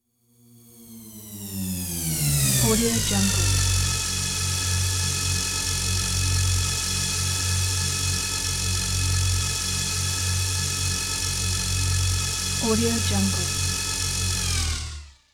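pod in with descending pitch stop and idle close up; prosoundfx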